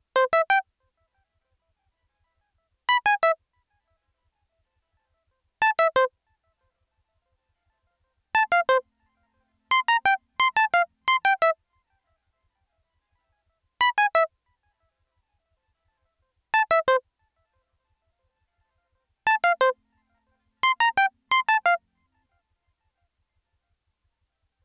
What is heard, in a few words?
Synth Boop